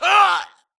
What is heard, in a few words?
grunt death quick; Titanfall 2